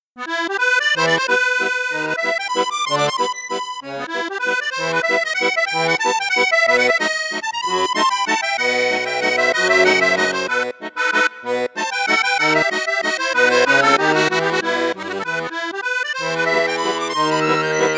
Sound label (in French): registre flute juste flute haute, accordeon; accordiola 08